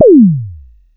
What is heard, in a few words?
TOM KIT D#4, TOM SYNTH; AKAI; USB Soundscan vol.20 - Fresh Disco House I